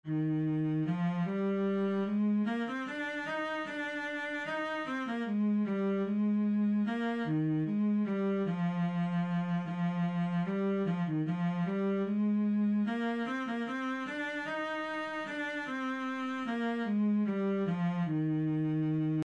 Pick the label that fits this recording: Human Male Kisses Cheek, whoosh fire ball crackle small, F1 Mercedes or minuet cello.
minuet cello